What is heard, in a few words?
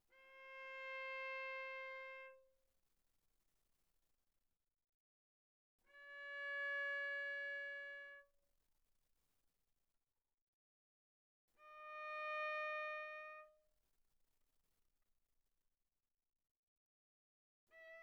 Viola arco sul D pp C5 B5